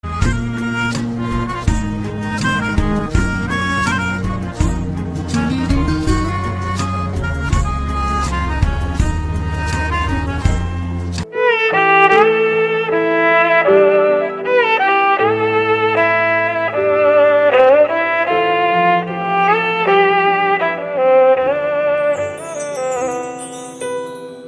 violin ringtone; hello movie; bollywood

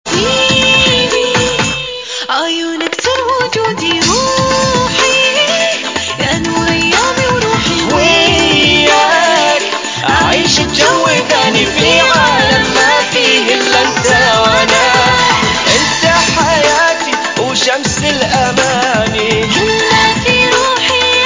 arabic french ringtone, islamic ringtones

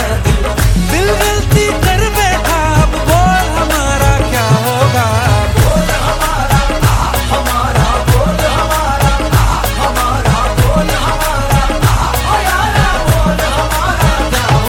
bollywood ringtone

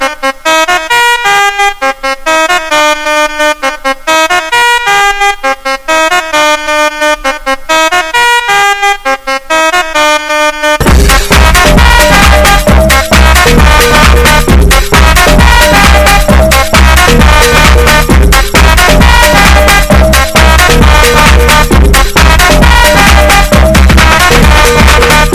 club sounds ringtone

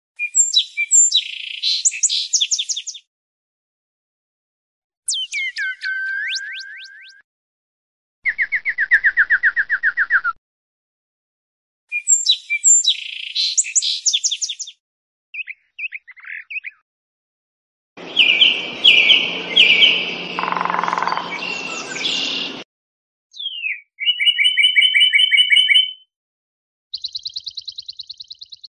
soft bird chirping on tree musical tone ringtone

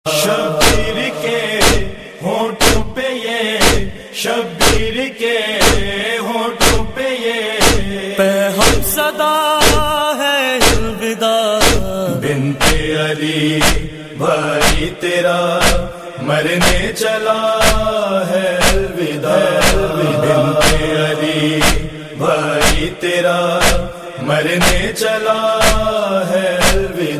noha alwidaa tune ringtone

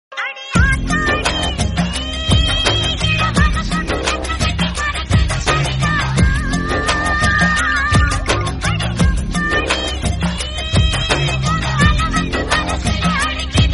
tamil mix ringtone; malaysia

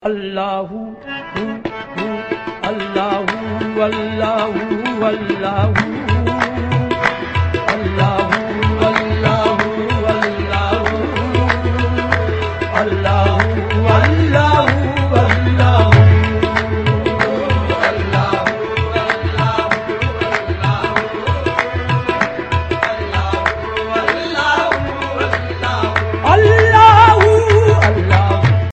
allahu allahu ringtone

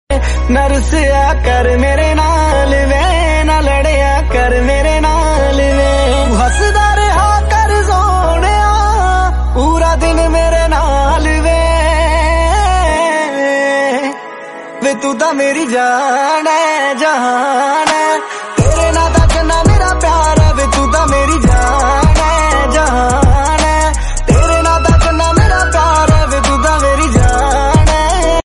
hindi ringtone, bollywood ringtones

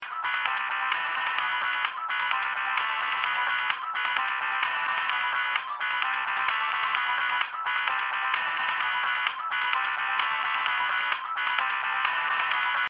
techno game sms ringtone

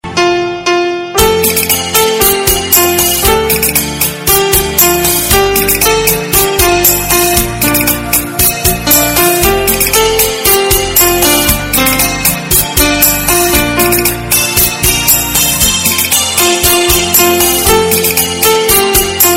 very sad love song romantic violin ringtone; bollywood

